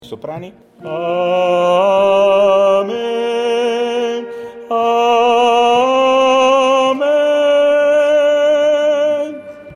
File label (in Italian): AMEN, Coro; Visita Arcivescovo